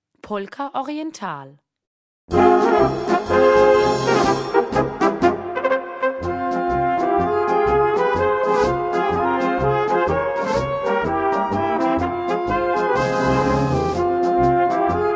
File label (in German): Polka Oriental